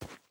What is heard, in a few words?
earth, step